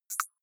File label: click hard high